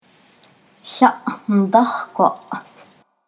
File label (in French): tambour; Yandahkwa